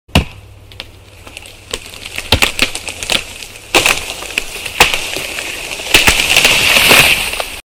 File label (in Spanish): arbol talado